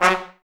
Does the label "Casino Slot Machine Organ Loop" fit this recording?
No